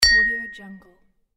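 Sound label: ceramic mug spoon tap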